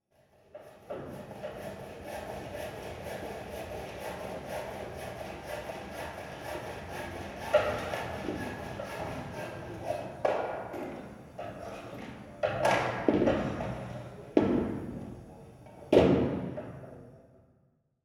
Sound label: hangar layer